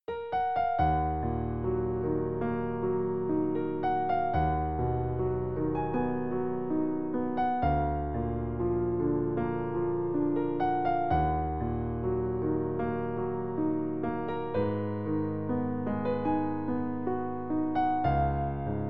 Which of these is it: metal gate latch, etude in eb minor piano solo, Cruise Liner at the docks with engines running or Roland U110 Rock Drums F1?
etude in eb minor piano solo